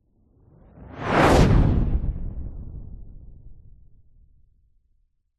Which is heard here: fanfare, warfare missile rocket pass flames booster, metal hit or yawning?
warfare missile rocket pass flames booster